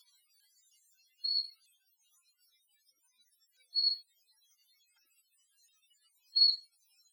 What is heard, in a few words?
calls; Armenia; Vorotan; Armenia2018